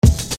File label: Bass Dr, EuroDance Bdrums